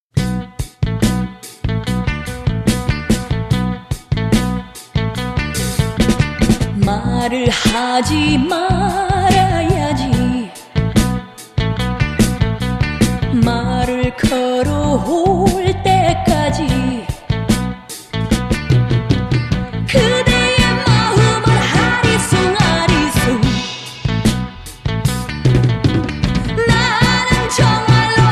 funky Korean soul

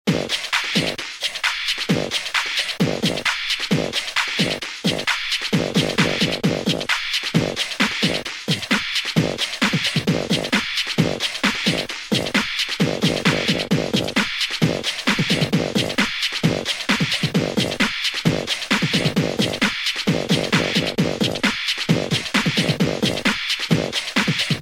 beatbox

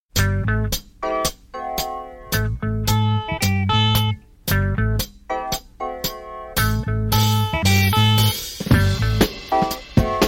funky Korean soul

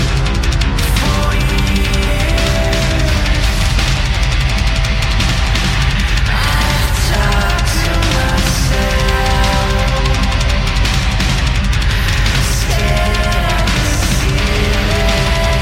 death metal

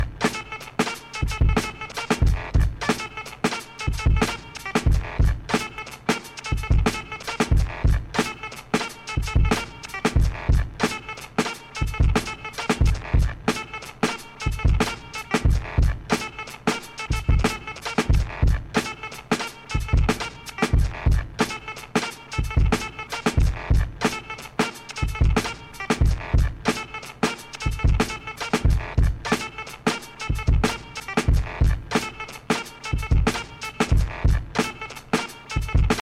clav crunch

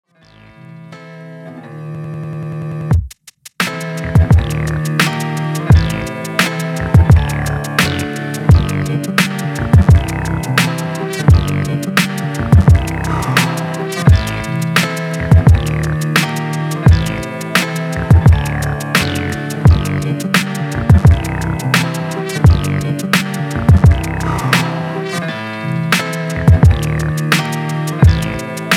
piano moog

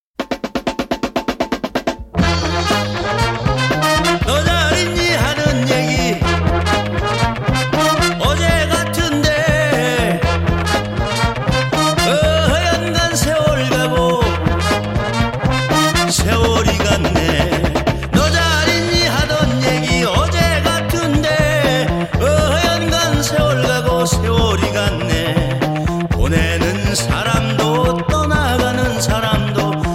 funky Korean soul

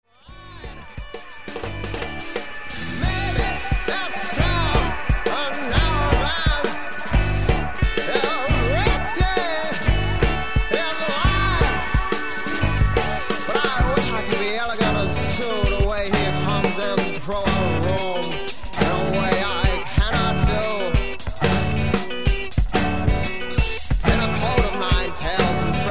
reggae

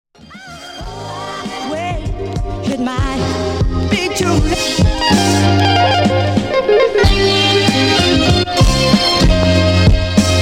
instrumental

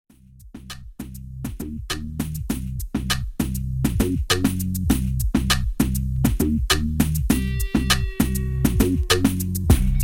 instrumental

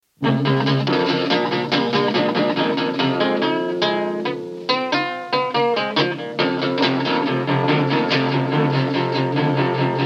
12 bar instrumental

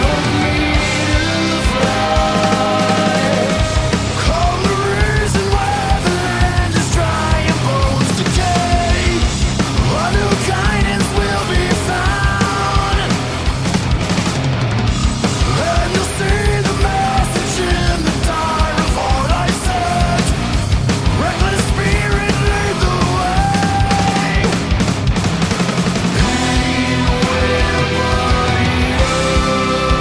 death metal

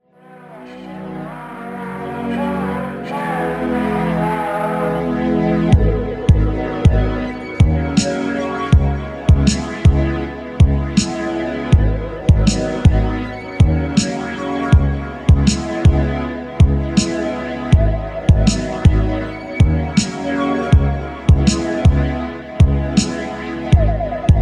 instrumentals

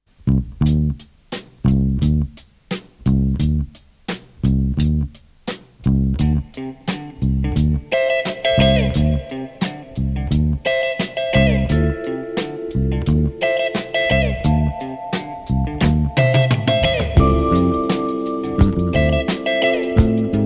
shakuhachi & koto jazz funk

